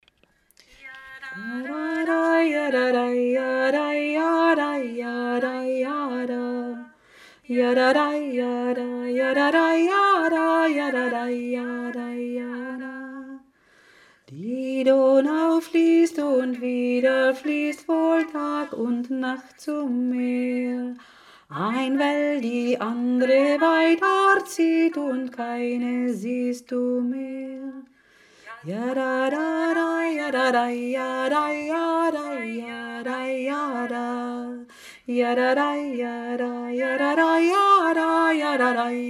zweistimmig; die donau

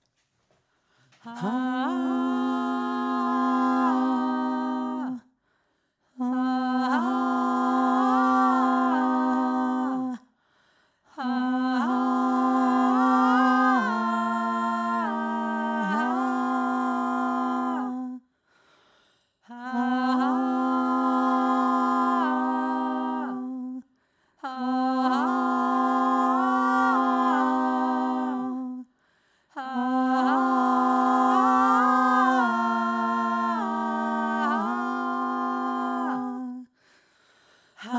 roma klagelied tiefe stimme

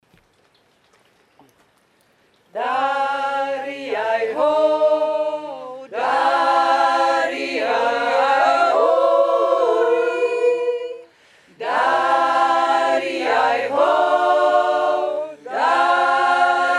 der mariazeller jodler

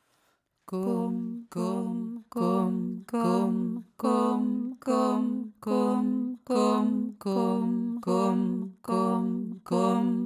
gum taka zweistimmig

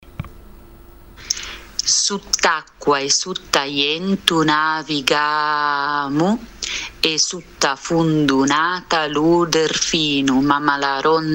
rondinella dialekt

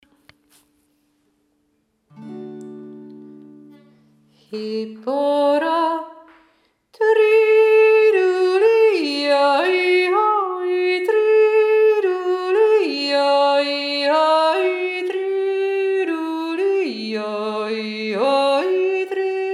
maorijodler

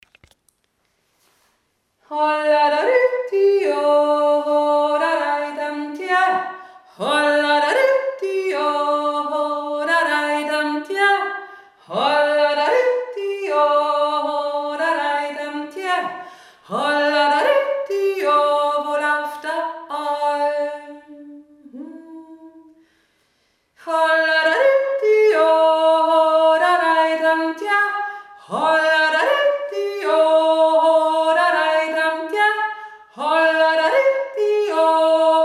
admonter echo

